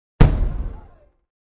Explsn, Single big gun or bomb explosion.; Part of Bombs (Sound Effects:Explosions)

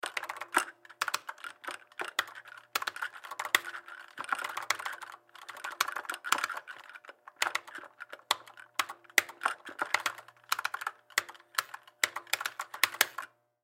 Arcade Game Play Button Joystick Movement; Arcades; Blastwave

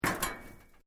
METAL LIGHT CRASH; Materials

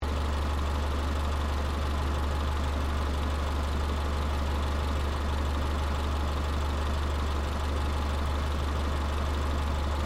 CAR SUBARU LIBERTY EXTERIOR IDLE ENGINE LOOP; Family members Subaru; Cars (Sound Effects:Vehicles)

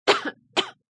Short Cough, Short quick cough; Sick

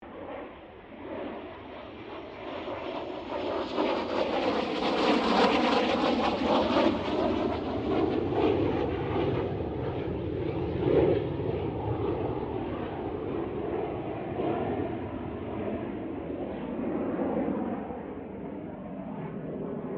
AIRCRAFT CA-13 BOOMERANG PASS STEREO

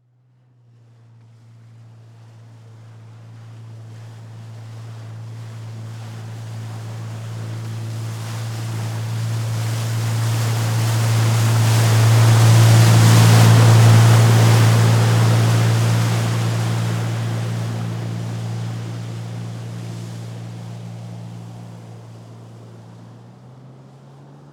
Vehicle Jet Ski Pass By Slow; Boats; SFX Bible

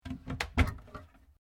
Door Pivot Interior Laminate Cabinet Open; Various doors; Miscellaneous Doors; SoundEffects Foundation2

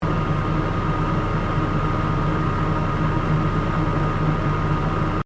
Fan oven exhaust high loop; Fans; Electronics; Various fans

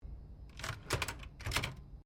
Typewriter Scroll Function, Old typewriters; 1950s; Typing; Offices; SoundEffects-Foundation4

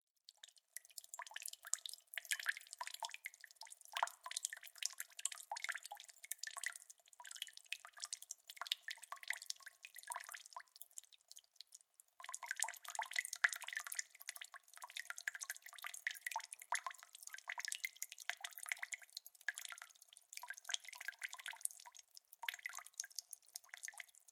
Water Drips Into Glass Fast; Kitchen Sound Effects; Kitchen (Sound Effects:Household)

